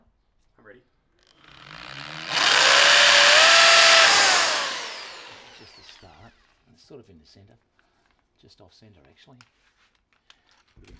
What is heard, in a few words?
Drill